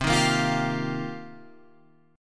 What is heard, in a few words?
C Minor 11th, C, down, Guitar, Open F; strummed; JGuitar